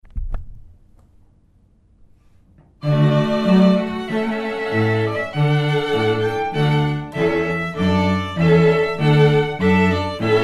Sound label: String Quartet; Violin, Violin, Viola & Cello; Ceremony Musicians